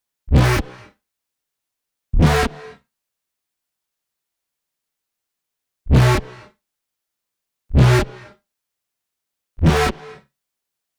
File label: 129 Bm Bass, Complex Electro Synths; Prime Loops; TranceOut